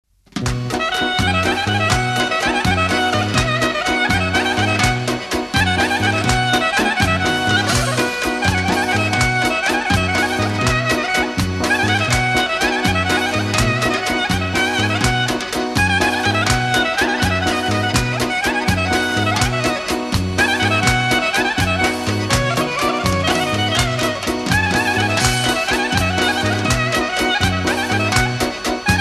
Wedding Melody

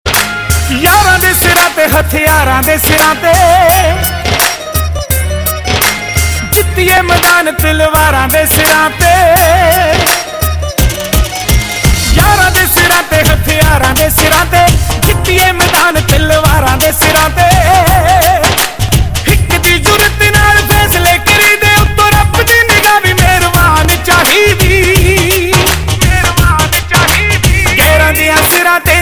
Punjabi

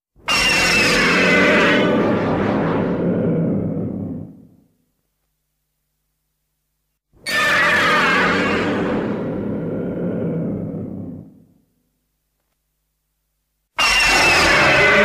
Roar; Spacegodzilla

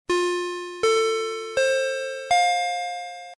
Airport Bell

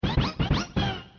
Sms; Yoshi

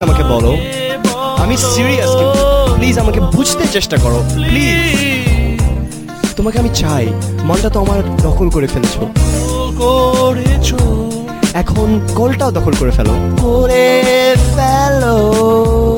Bangla Tone

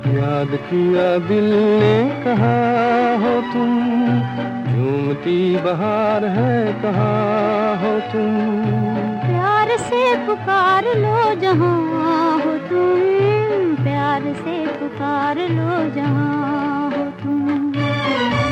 Old Song

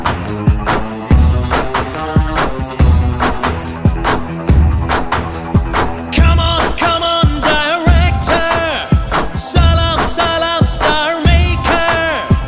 Music

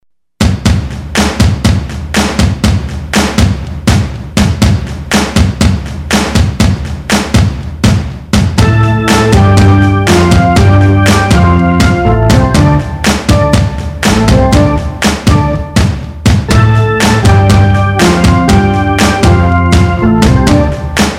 Hockey Beat

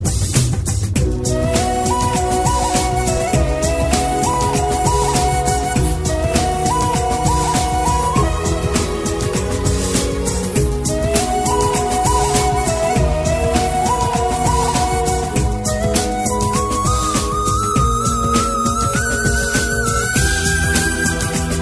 Taal

